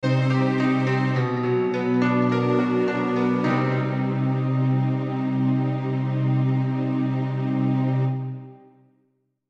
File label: Piano Strings Melody Min; Musical; Blastwave